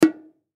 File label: Bongo Hit High, Bongo Hit High Light; Drums; Blastwave; Sound Effects:Musical